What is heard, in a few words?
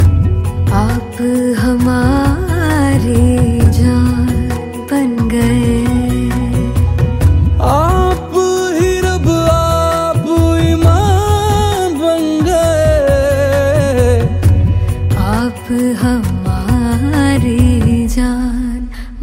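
female version ringtone, bollywood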